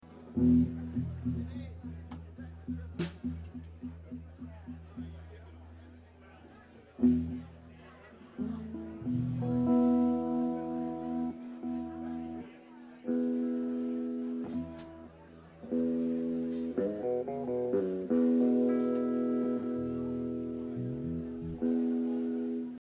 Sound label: tuning